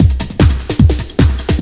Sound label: DRLOOP; Descent II